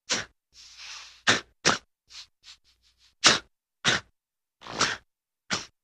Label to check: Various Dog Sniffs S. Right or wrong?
Right